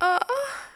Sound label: aie